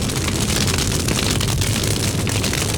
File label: Fire Loop; SFX Kit; Destruction